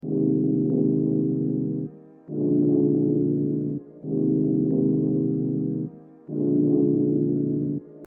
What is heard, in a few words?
Pad house chord; boss